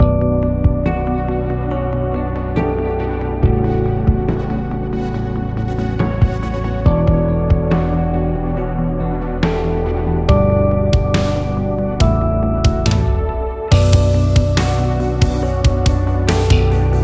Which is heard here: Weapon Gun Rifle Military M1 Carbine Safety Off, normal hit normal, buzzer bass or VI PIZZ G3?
buzzer bass